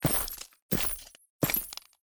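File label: broken crystal footsteps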